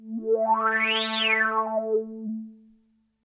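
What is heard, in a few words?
synth electro 140 A; Techno; Keys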